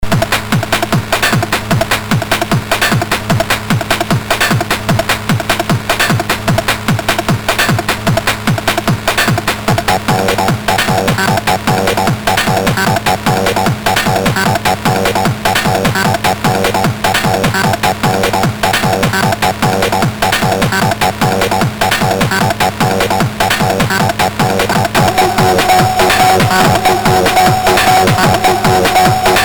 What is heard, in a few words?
Drums, sid CSG8580R5